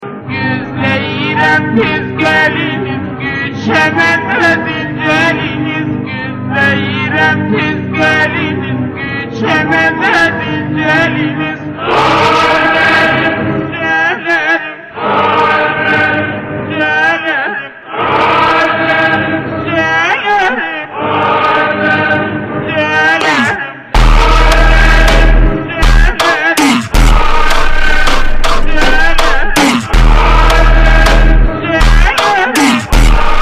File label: FUNK SLOWED REVERB 128